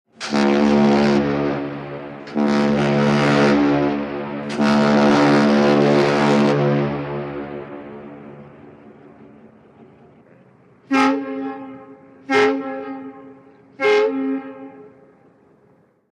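BOATS & SHIPS HORN: exterior: 3 long blasts, very low tone with echo, answering tug horns.; Queen Mary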